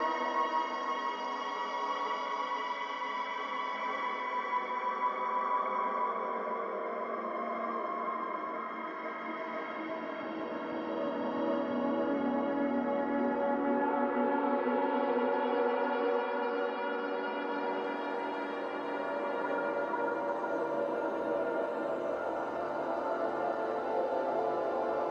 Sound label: Soundscape; FX